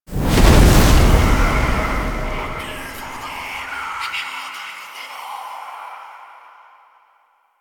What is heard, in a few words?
Firelight Activate; ambience; Warframe; Entrati